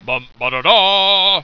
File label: vocal fanfare